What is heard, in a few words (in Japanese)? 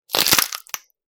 骨が折れる音